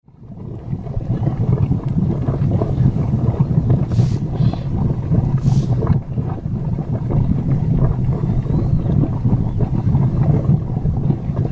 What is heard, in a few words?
two kinds of boiling mud